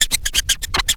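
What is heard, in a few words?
scratch, record; XP RECORD-FX; Best Service; XXL Production Effects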